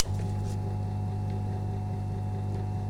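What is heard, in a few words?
shredder; 1000fx; OFFICE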